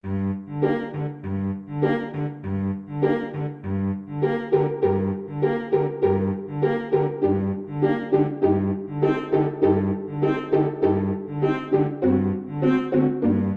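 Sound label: viola karaoke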